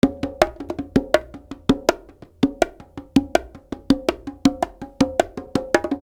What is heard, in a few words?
Live Perc Riffs; Jungle Frenzy 1